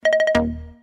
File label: Message Bell